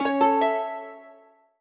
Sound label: Harp; HintsStarsLite